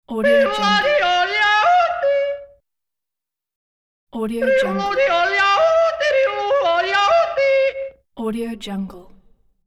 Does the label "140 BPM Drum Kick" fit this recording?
No